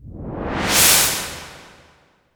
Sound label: IMP WIPE, Cinematic Impact